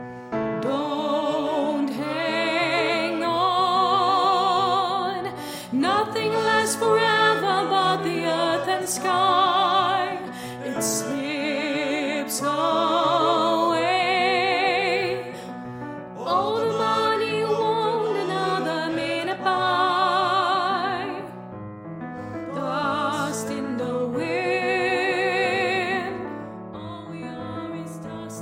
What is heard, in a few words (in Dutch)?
sopraan